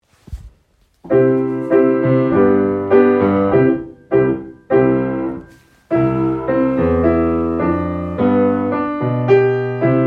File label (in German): Klavier